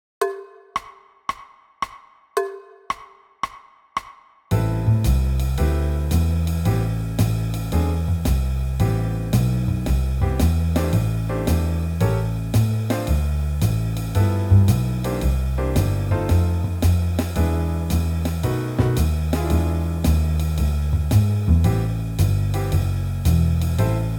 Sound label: 112 bpm Backing Track for Solo